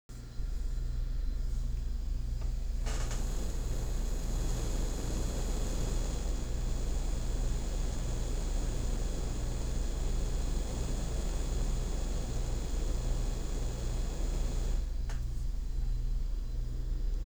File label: factory fan